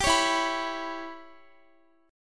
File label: E Minor 6th E, Guitar; Em6; strummed; x,x,17,18,16,18